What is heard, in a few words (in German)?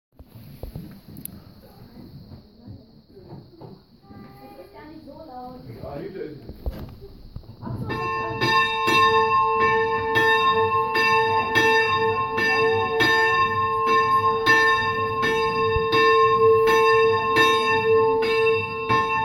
glocken; 2016 fuehrung stift; Geschichte